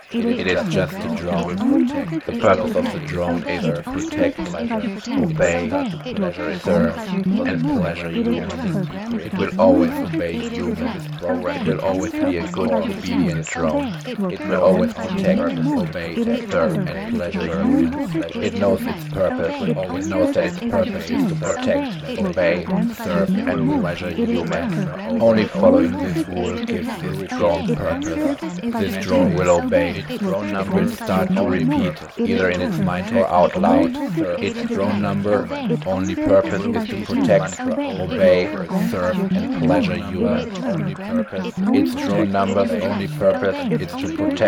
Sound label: Drone loop heavy; drone training